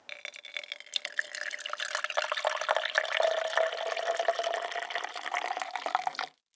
pouring water

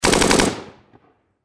Barrage machine gun

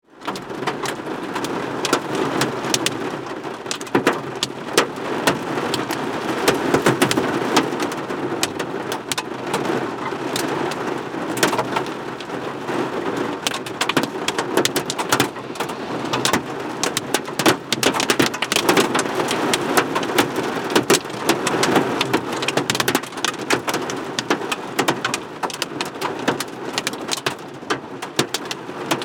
car rain; افکت صوتی

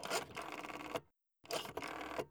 Old phone dialing

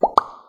pop; افکت صوتی

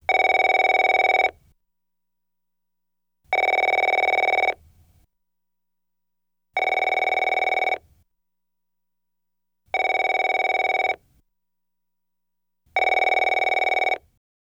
modern phone

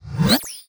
Woosh dry; افکت صوتی

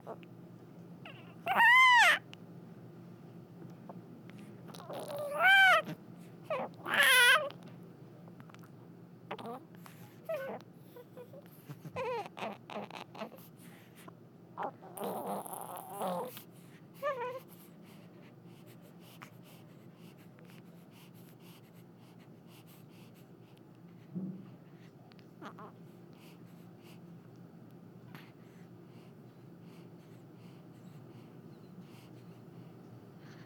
newborn baby

